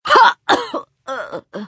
female die; firefight

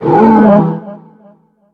Death; Being of End; Kilta

